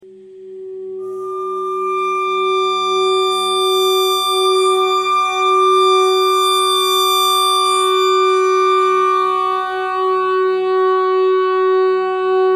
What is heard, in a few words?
clarinet notes e clarinet notes e thread noise